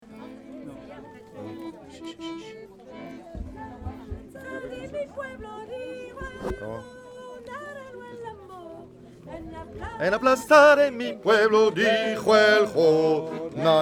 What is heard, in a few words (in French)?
basse; En la plaza de mi pueblo